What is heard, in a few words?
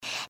breath; pony; Twilight Sparkle